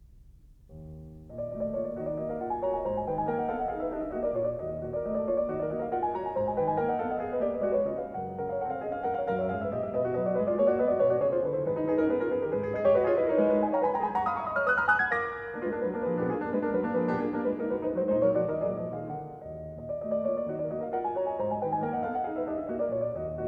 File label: Preludes for piano, Prelude in E flat minor; Rachmaninov; 24 Preludes